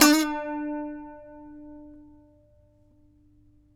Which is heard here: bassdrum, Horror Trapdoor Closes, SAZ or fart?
SAZ